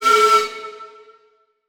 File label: Title Transition Feedback Blast